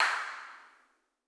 incinerator dirac

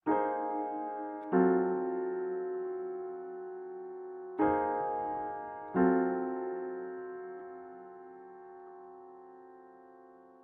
tune

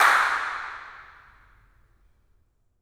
incinerator dirac

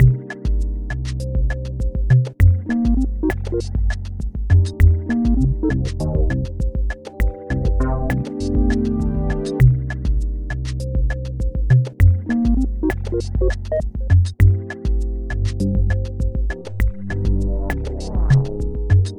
loop; pseudOs

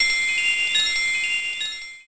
sfx bells

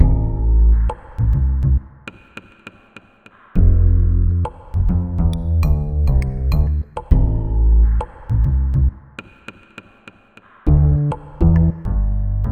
swamp; Blazer

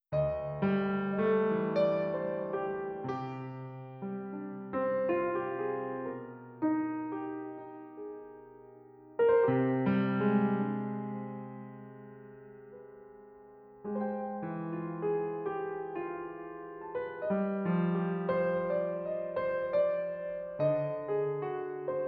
piano

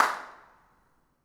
incinerator dirac

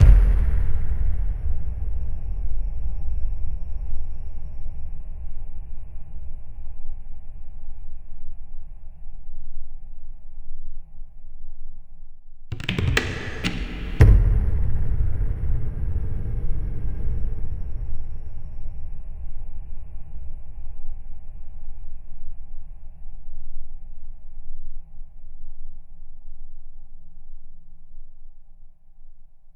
ambiance; DS school project game

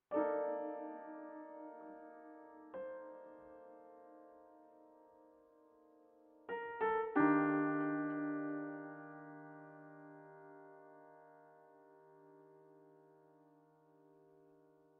tune

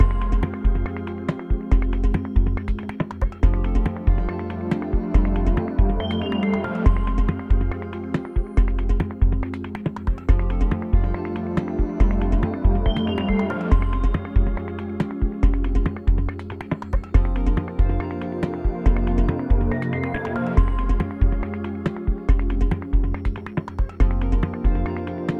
puzzle action loop total; freeflight

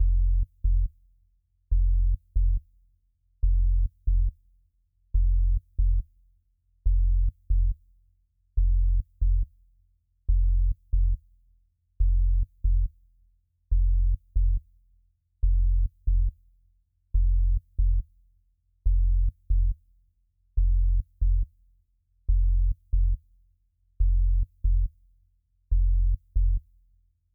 puzzle action loop layer bass; freeflight

